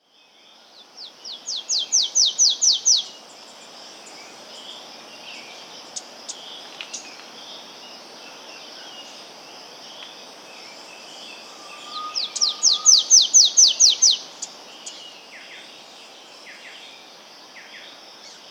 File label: Golden-Bellied Warbler (Basileuterus Chrysogaster), Andean Birds; S. America